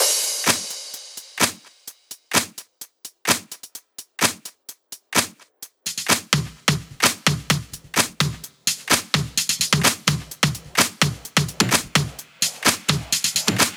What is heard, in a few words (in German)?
Drum Loop 128BPM FULL ohne BD